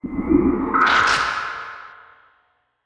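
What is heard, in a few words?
ghost gate attack, monster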